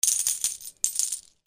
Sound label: MONEY